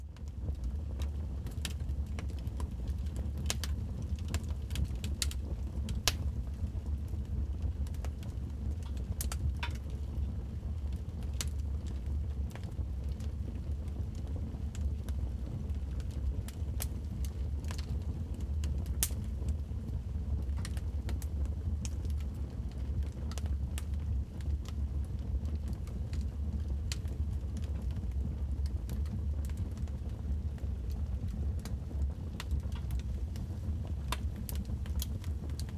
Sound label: fireplace indoor; best service Blue Box